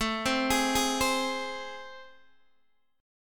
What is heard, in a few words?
A Minor Major 7th, down, Guitar, DADGAD